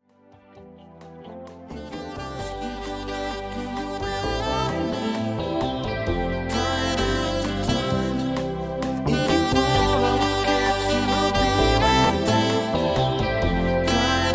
sax

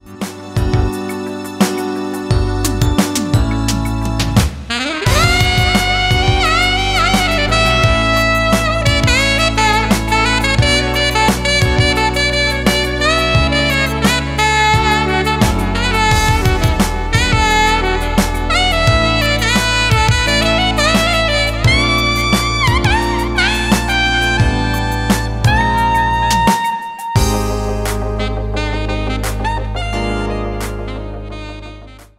sax

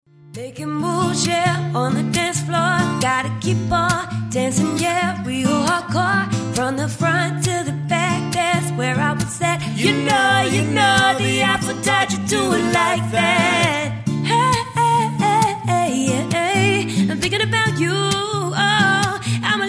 duo ukg acoustic

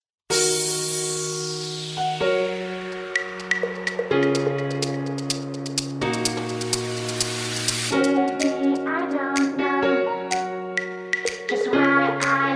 Pop/Jazz/Ibiza Saxophonist

